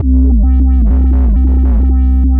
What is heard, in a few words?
Roland B Line, Roland S100; musicradar-synth-samples